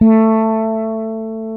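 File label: A 4, warm fretless, bass; Spectrasonics; Bass Legends CD 1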